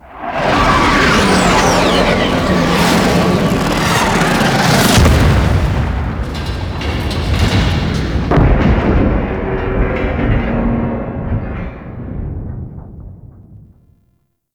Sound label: Skiff Crash Sentient Fly By; Cinematics